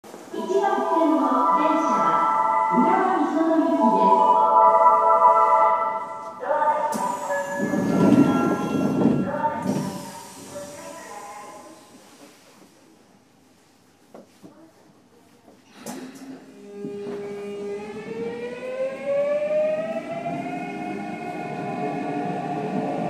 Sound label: vvvf, mitsubishi